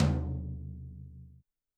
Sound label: Tom Jazz Floor